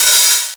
Open Hihats, Cymbals; Vengeance Samples

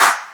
Claps

